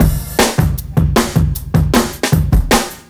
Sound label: Drum Loops, 155; RideTheBull; M8; Electronisounds